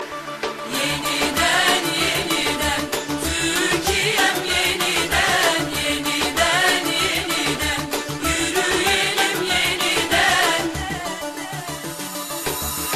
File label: turkey turkish